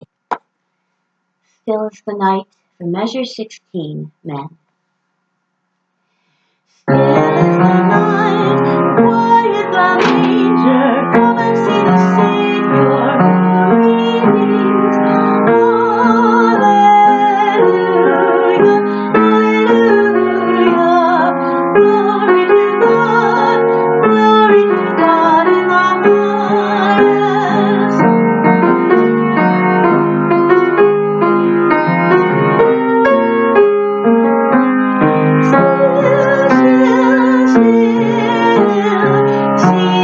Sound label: men, choir; stillisthenight